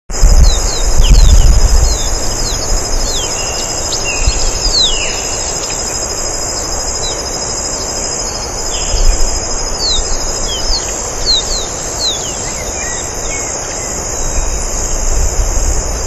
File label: Sporophila pileata; Santa Maria